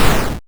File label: destroyed player